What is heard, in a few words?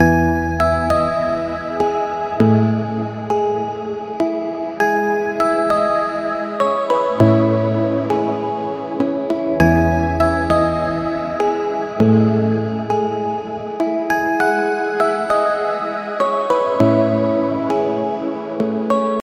Bell pluck, chord progression, top catchy melody, E major, high reverb, 8 bars, 100BPM; Audialab EDM Elements